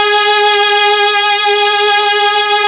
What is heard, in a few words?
STR MELLOT, Orchestral Strings